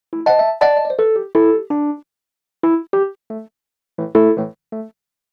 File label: 085 NuJazz MarkII Amaj; Piano; E-Piano; Pro Tools Essential Loops; Digidesign